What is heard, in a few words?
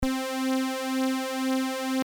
Brs vco